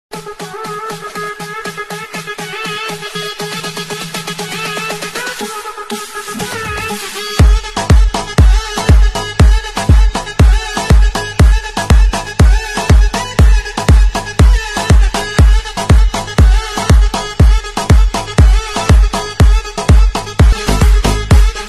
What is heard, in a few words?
arabic emotional ringtone